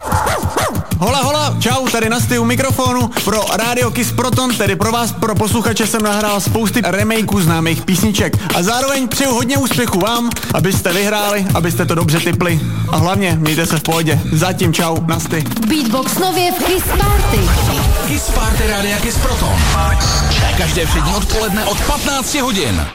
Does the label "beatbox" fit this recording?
Yes